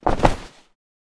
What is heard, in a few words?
Human body fall on concrete